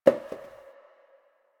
Janitor Paper Towel Drop

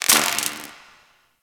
Robot Repair Spark; Ambience; Props; Warframe; Corpus Gas City

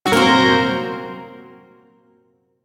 Blues Chord J; Shawzin; Warframe; Duviri Shawzin

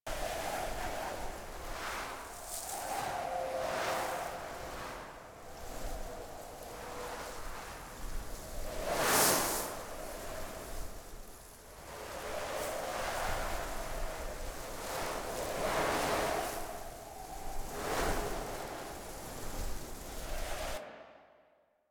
Landscape Wind Low Loop; Warframe; Ambience; Venus Open World

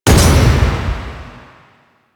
Psychic Bolts Attach; Warframe; Nyx

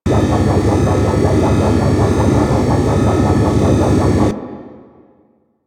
Gas Robot Machine Loop; Ambience; Props; Warframe; Corpus Gas City

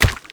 Proto Infested Runner Step Default; Warframe; Steps

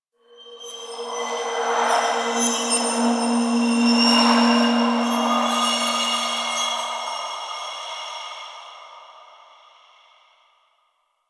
Interior Cave Spirit Gust, Ambience; Warframe; Duviri

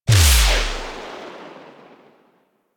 Requiem Mod Install; Warframe; Kuva Lich

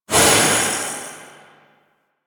Void Release Short; Weapons; Warframe; Duviri; Incarnon Adapters

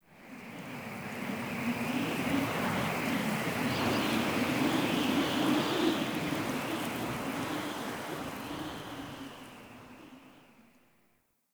Under City Wind Gust Strong Whistle Rope

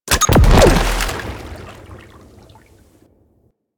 Fishing Capture Success; Warframe; Venus Fishing Spears

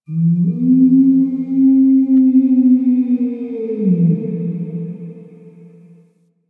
Drifter Camp Valley Night Distant Creature; Ambience; One Offs; Warframe; Quests; The New War

